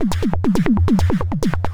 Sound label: percussion loops, Techno; PS-45